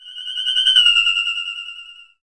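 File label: flying saucer, Anime Sound FX; COMIC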